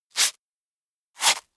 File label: scratch